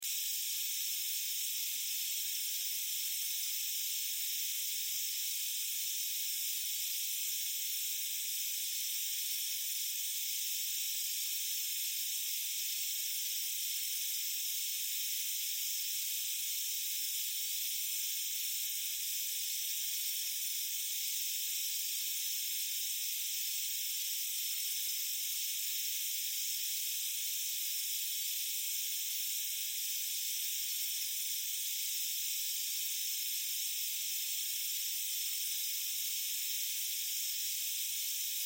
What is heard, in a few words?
Gas Leak Line Natural Air Expel Loop; Machinery; INDUSTRY; Blastwave